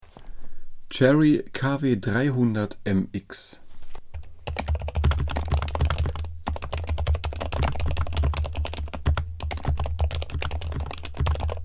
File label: Soundcheck Cherry KW 300 M